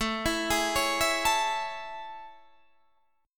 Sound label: A Major 7th